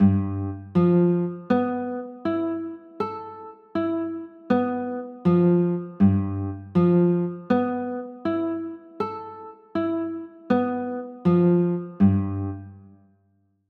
g 13, chords

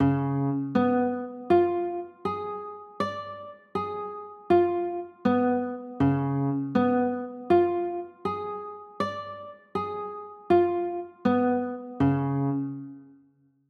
d flat 7 b9, chords

